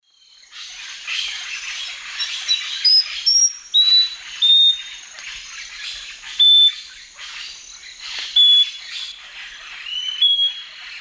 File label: Microcerculus marginatus, song; S. America; Eastern Lowlands